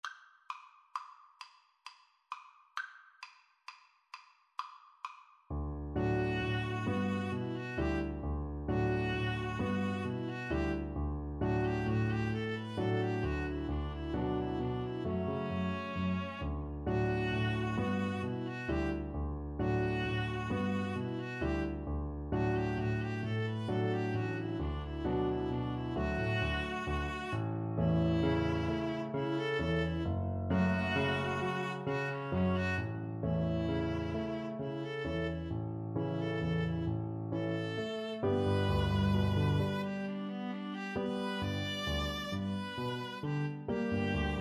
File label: lakme, Viola Cello Duet